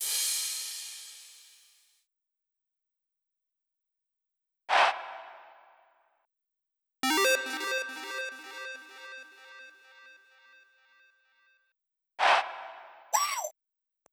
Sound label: Drum Loop 128BPM FX; VEC5 Drumloops; VEC5 Multi Loops; Loop Elements